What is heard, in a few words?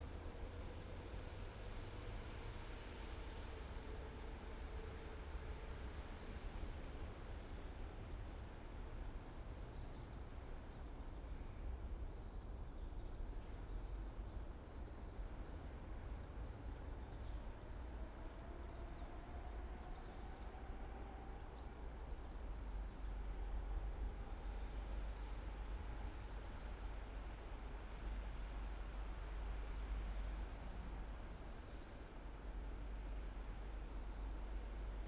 yamanakako sound